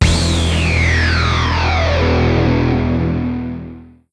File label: slunk; LameXP